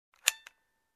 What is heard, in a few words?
Camera Rewinder Clank